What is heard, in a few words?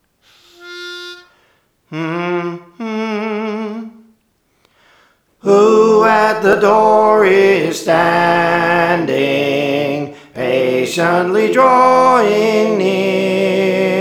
Soprano; Praise For The Lord Training